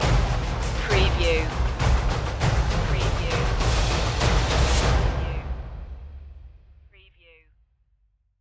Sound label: wonders of the world perc stinger